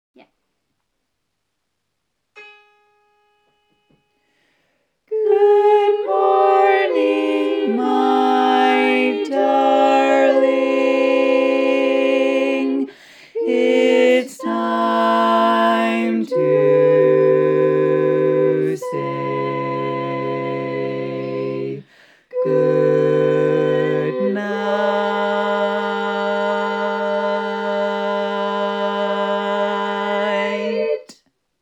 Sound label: Bass Dom